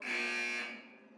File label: alarm